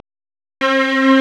STRNG; CMP - Analogon

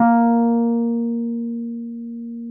Wurlitz EP A#; Sonic Reality - Vintage Timetraveler

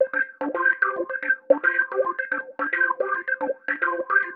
Wah Wah, Organ, Loops, Effected; Sonic Foundry (Sony Creative Software); Joe Vitale Organ Donor

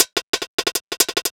Speed loop Hat pattern, Drums Beats

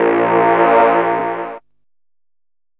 DR. LOOP, TRANCE; X-Static Goldmine 3

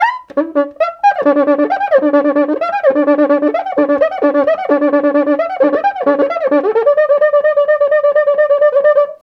130 bpm, Rio batucada

